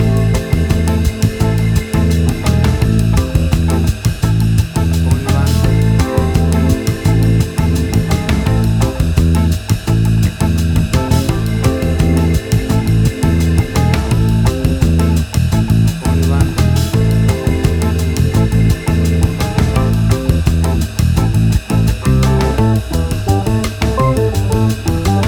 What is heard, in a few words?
Acid Jazz Cuban Fusion Music; 30 SEC